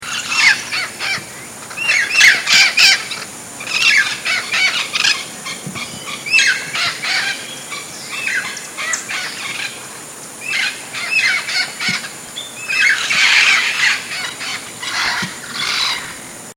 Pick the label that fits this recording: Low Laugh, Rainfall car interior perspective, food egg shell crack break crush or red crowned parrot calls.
red crowned parrot calls